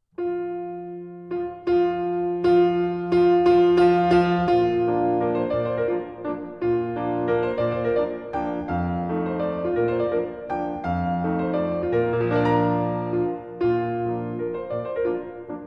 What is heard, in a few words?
mazurka; chopin